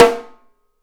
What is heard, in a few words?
Studio Snare